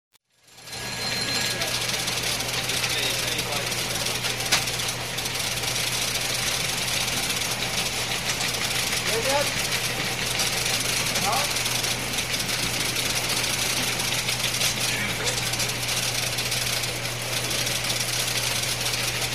City Room; SOUND EFFECTS1

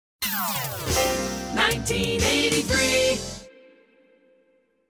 Hits, Drops

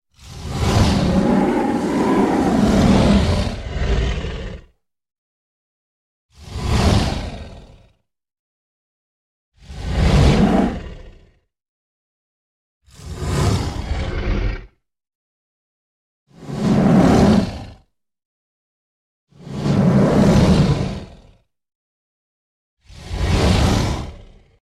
Animal stinger - Multiple lion growls w whoosh x7; Hollywood Edge Sound Designers Tool Kit

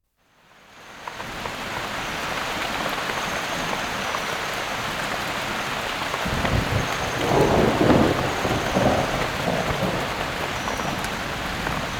Heavy Downpour and Thunder; Dr. Sound Effects; 210 Nature Sounds - 20 Hours of Relaxing Natural Ambiences for Meditation and Sleep

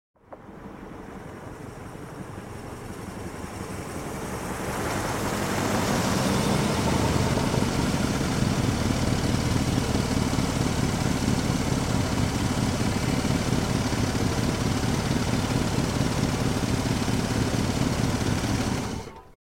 Car in slow close idle off; Cars

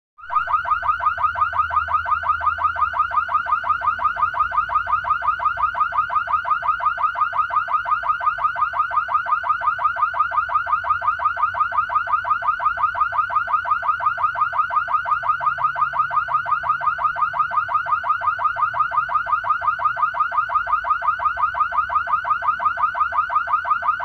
Car Alarm Fast Oscillations; Cars

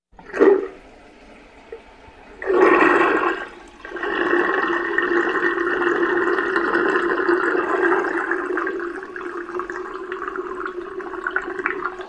Water Going Down Drain, Household

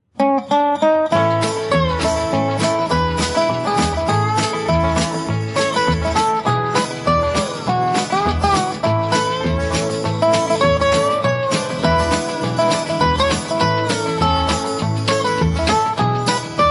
Steel Guitar Chimes; Steel Guitar Country

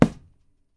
PUNCH C, FIGHT; 1000fx

